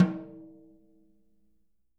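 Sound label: jazz snares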